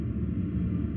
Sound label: shrink